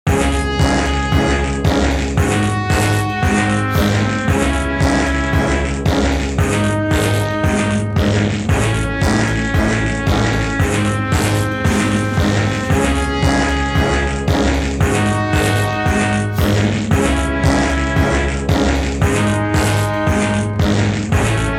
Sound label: Marching (Fart Version); Sex With Stalin